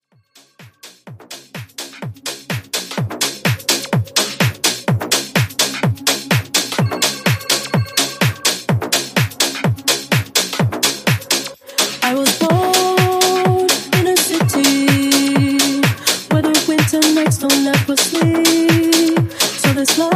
Tech House Mashup; Top40

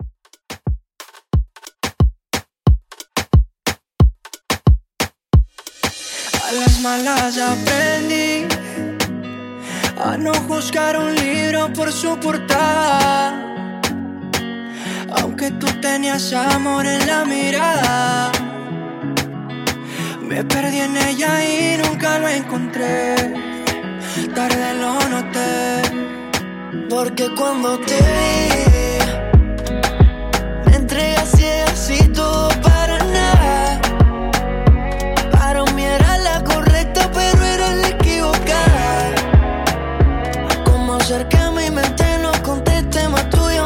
In Outro Reggaeton 90Bpm

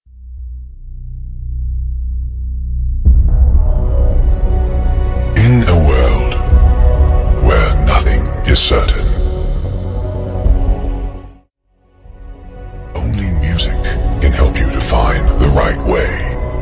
NYE Countdown Intro

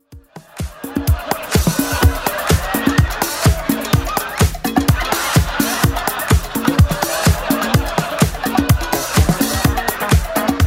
80s Club Edit 126bpm

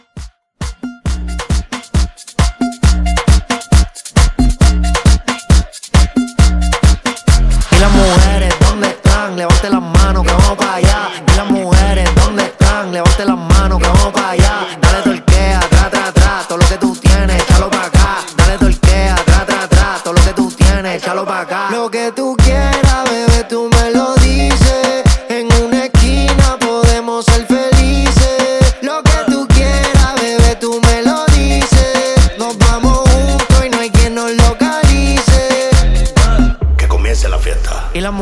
Latin Club 135; Latin Club Edit

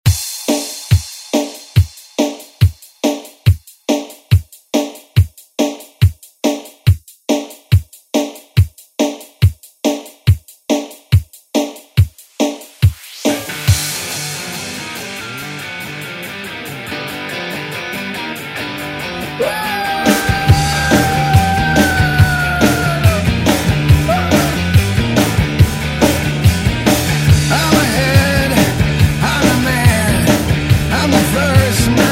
90s ReDrum; remixplanet

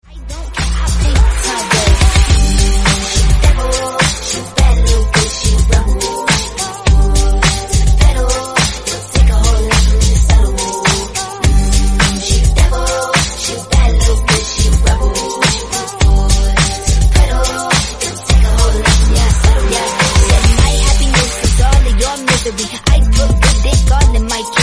105bpm Gm

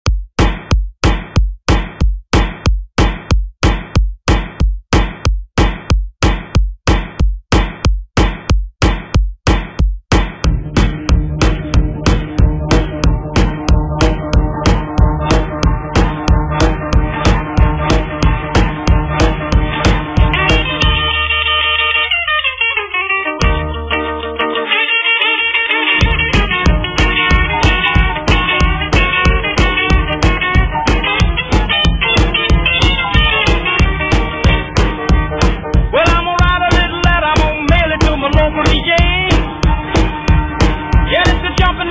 50s Grid Redrum 185Bpm

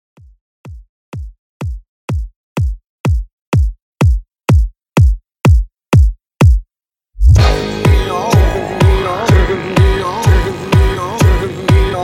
Dance Redrum, 125 Bpm

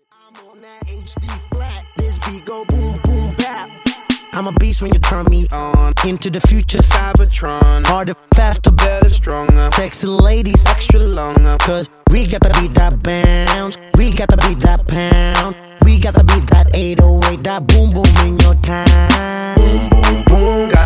122 Bpm

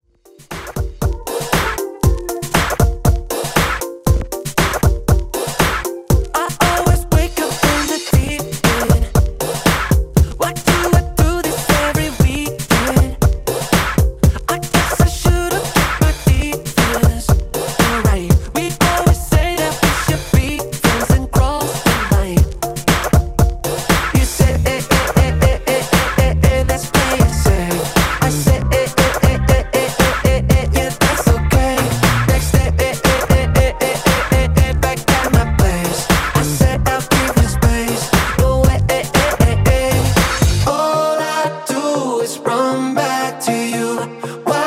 Redrum 118Bpm; djyan

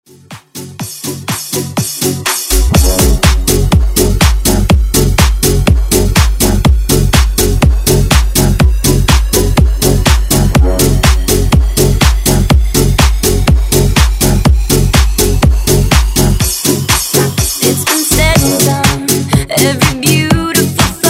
Pop Bounce; Pop Bounce Edit

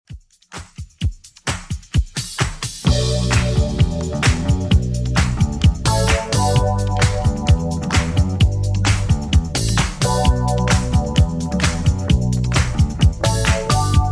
80s Redrum 130bpm

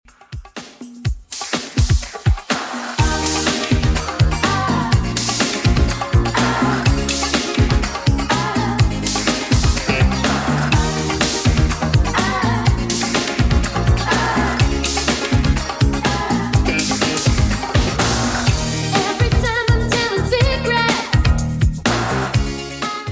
80s Redrum 124bpm

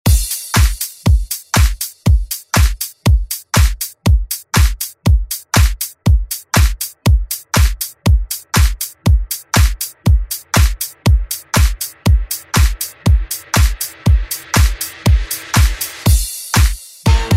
Oldschool Redrum; Deejay Hit

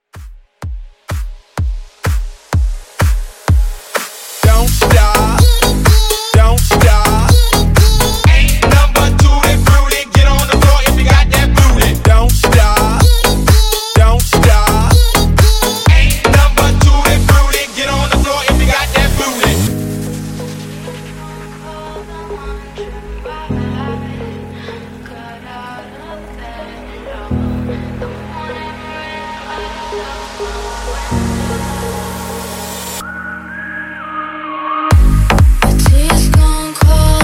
House Mixshow Edit 126

